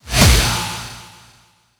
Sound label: Aggressive Transition